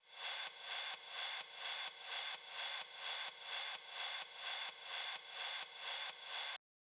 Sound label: Drum Loop 128BPM Noise; VEC5 Drumloops; VEC5 Multi Loops 128BPM; Loop Elements